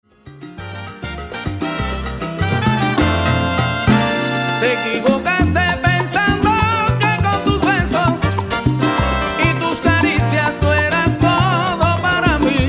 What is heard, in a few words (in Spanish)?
Salsa